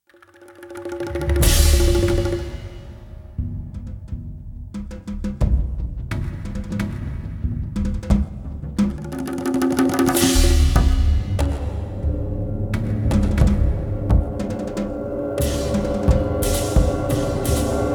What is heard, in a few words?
nightjungle